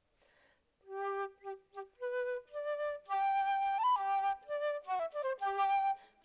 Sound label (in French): morceau flute trav